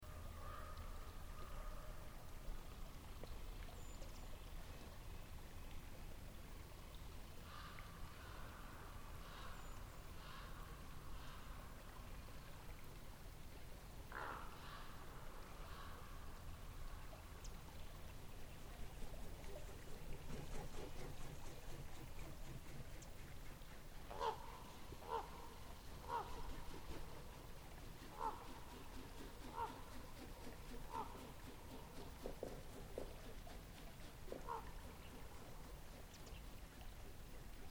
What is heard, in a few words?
ravenflight